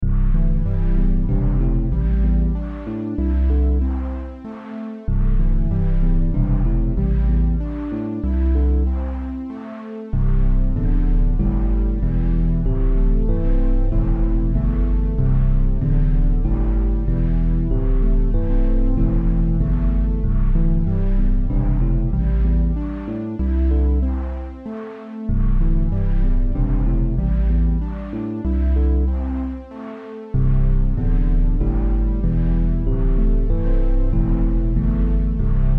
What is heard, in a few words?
Calm beat